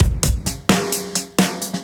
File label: Beat Style, 130bpm, breaks